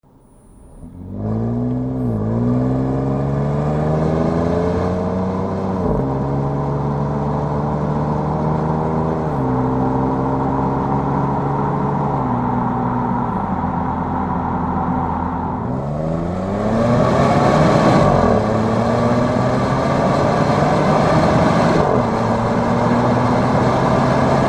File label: Volkswagen Touareg hybrid